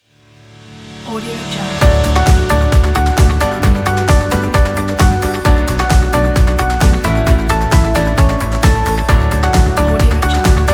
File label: media logo; red ninja